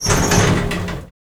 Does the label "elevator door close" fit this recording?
Yes